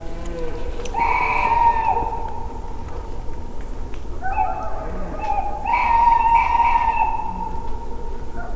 macskabagoly; termeszetben

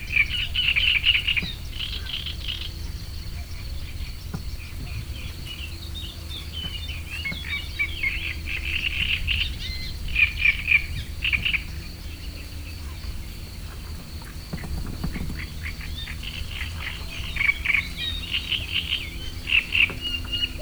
cserregő nádiposzáta kakukk gáton elhaladó autó; termeszetben

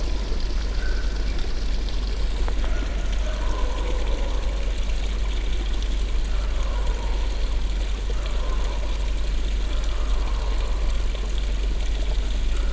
patak kutyaval

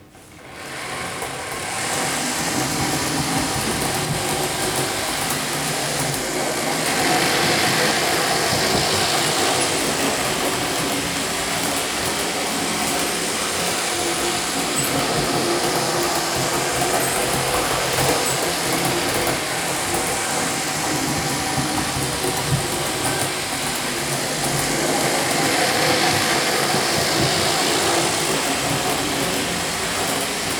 néhanykor, modellvasút h0; perkupa

